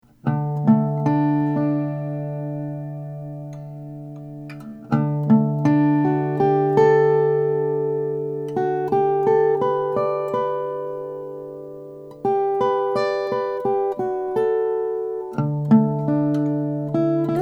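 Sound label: lowden wl5 brazilian; 40th anniversary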